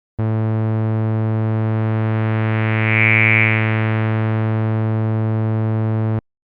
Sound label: resonance cutoff